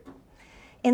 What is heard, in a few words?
Birds